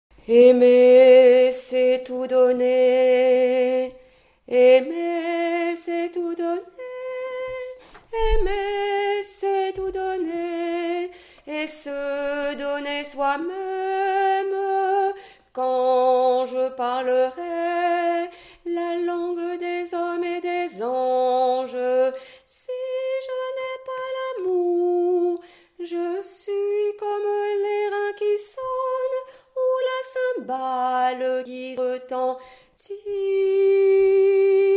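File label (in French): Mariage Aimer B, chœur; Basse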